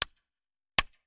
Musket Foley; Sound Ideas; Guns

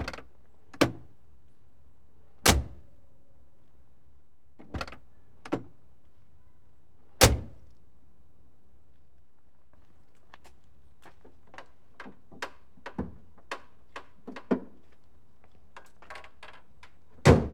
car door open close, street traffic; best service Blue Box

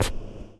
slide

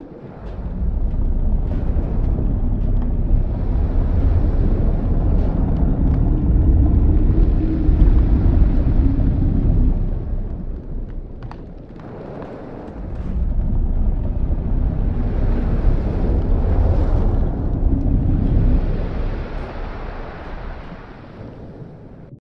Sea Inside

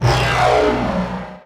Pickup Scifi Energy; SFX Kit

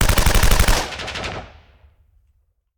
Machine Gun; Sound Ideas; Guns

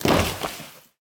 Bodyfall Tile; SFX Kit; Bodyfalls

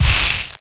Ranged Magical Medium; Kilta

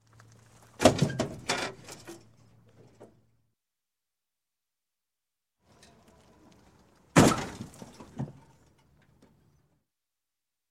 Crash, Auto Small Head On; Sound Ideas 2000 Series

